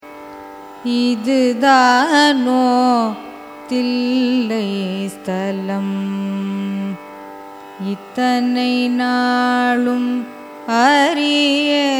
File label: raga behag carnatic